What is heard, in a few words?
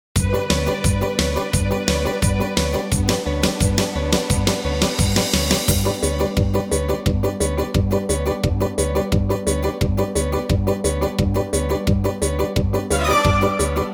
modern string minus, domra; xitstudio